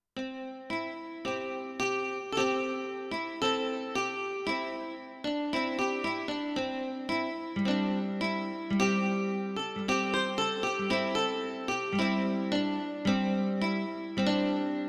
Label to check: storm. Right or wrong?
Wrong